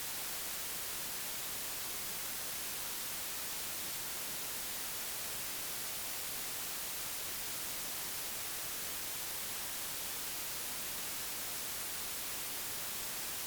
recording wifi 2.3 mhz; SDRSharp

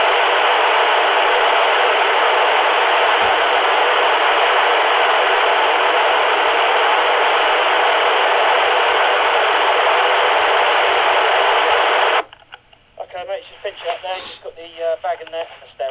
recording talkie 455 mhz external connection

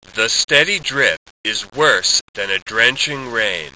sinewave synthesis

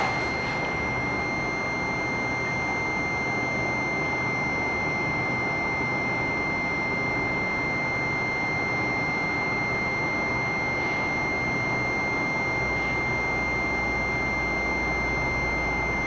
thinking modulating a tone

